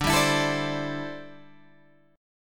D 7th Suspended 2nd Suspended 4th, Guitar Open G

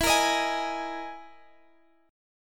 E Major 7th Flat 5th E, Guitar, Standard; strummed; JGuitar

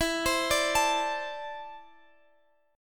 E 7th Suspended 4th Sharp 5th E

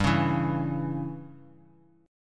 C sharp Diminished, chord

